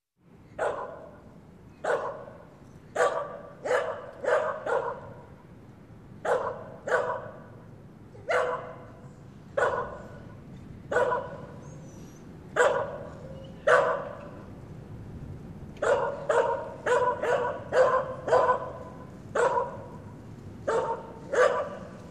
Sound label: Dog barking in street at night